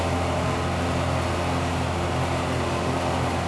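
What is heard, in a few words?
mine Fan loop; machines mechanical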